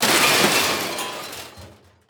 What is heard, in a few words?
metal debris